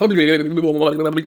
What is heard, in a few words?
Mouth Fx, Vocal